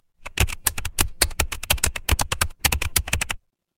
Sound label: office keyboard